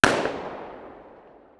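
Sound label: k3 fire distant; armenian; vj weapons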